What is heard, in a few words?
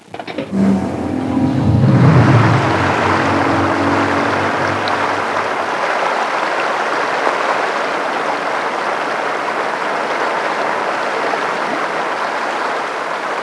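water pump draining; ambient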